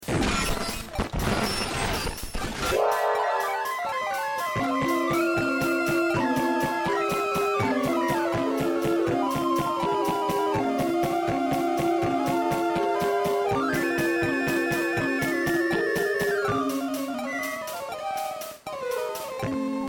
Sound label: Casio fairies go beep; Glonous Cultual